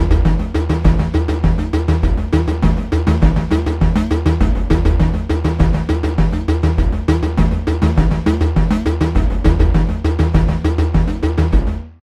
Indian Udukai Uduku; Drumwavy; Syntheway

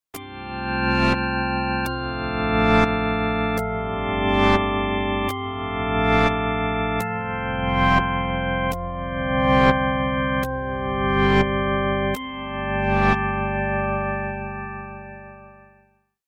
Panned Particles; Harmodion

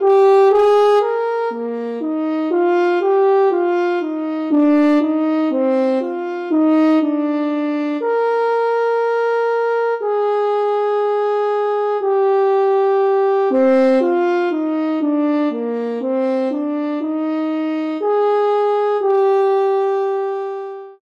French Horn Legato; Aeternus Brass; Syntheway

